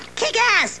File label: kick